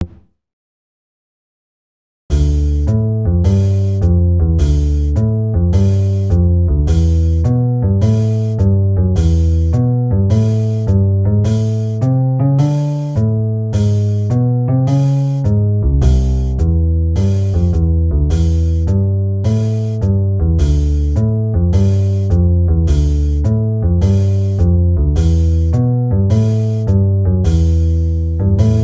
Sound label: 105 bpm